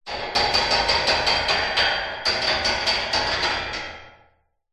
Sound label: foley metal bars metal object scrape run across rattle